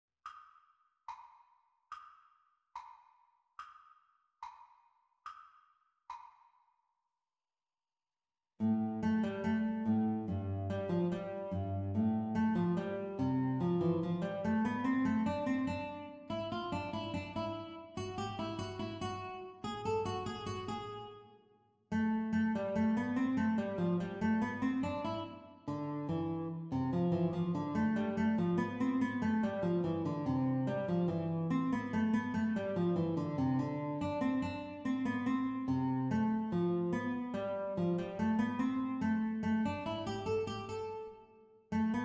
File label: Guitar Duet Playalong Exam Speed